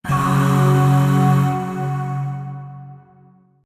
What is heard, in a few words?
Void Shawzin Chromatic Chord D; Warframe; Zariman Void Shawzin